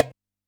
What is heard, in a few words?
DJEMBE, Yamaha DD-65; PERC